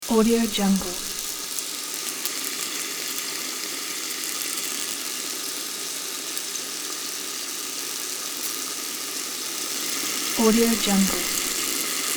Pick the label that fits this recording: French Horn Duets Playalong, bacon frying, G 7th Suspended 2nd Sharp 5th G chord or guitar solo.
bacon frying